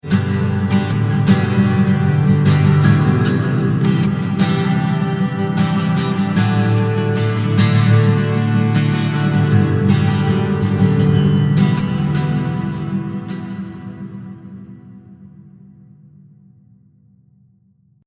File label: Git stereo; 40sec 150ms